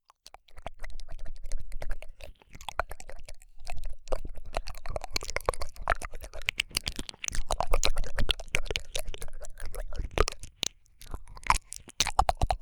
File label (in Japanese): 耳舐め, ASMR